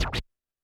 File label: percussion, Roland TD-20